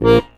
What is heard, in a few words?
HOHNER, AKKORDEON